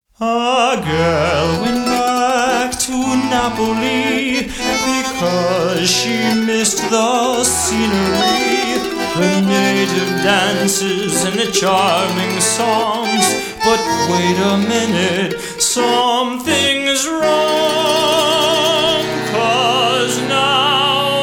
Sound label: classical singer